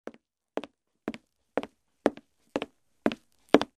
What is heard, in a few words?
Steps on the wood floor in shoes